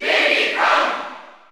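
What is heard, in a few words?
Cheer German, crowd; Diddy Kong; SSBU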